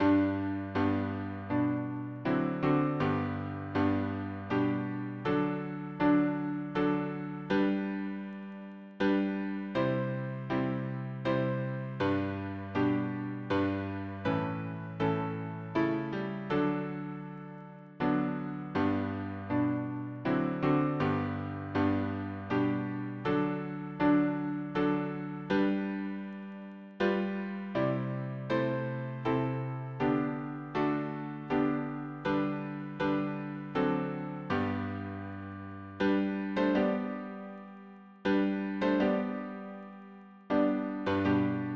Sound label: harmony piano